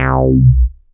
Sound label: ROLAND JX8P C2; Big Fish Audio - First Call Vintage Keyboards